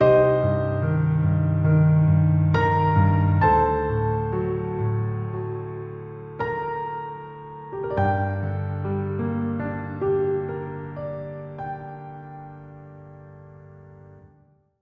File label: 15sec Piano